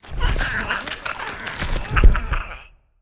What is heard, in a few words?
Lithoid portrait mantis; Stellaris